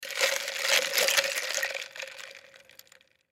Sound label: Cup Stir Shake Move Slosh Styrofoam Ice; Drink Mixing; Foley; Blastwave